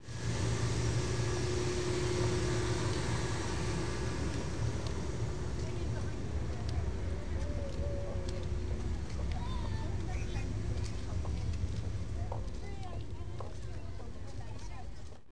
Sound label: early, Soundwalk